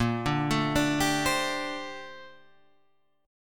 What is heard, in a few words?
A sharp Major 13th, Guitar Open G